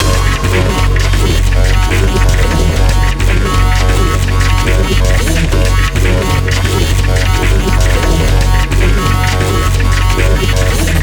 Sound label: BGM; Luxembourg Zwee